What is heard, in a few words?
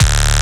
Synth Hit D; Zenhiser